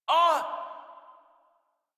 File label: Pain, Barks, Battle; Warframe; Baro Defense